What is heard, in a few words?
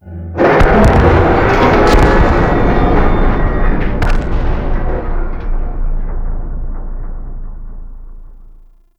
Bridge Crash; Gameplay; Mission One; Kahl; Warframe; The New War